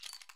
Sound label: click; csgo; warmusic